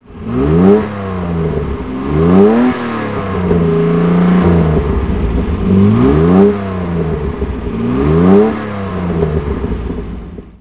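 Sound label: mgc throttle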